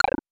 Lose Power Taunt; Kirby; Super Smash Bros

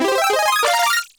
Powerup Propeller Suit; New Super Mario Bros. Wii

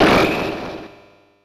Strong Hit; Super Smash Bros

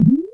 Ball Collision; New Super Mario Bros. Wii

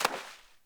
Splash Enemy

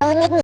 wierd R sound; super mario paint

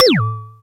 sound effects talk end; Super Mario Sunshine

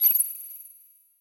Pixie Dust; Super Smash Bros

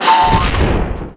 box break; mario 64

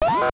boss down; super mario bros 2 nes

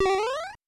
Super Jump; Mario; Super Smash Bros

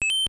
map travel; super mario bros 3 nes

